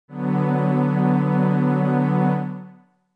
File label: B Minor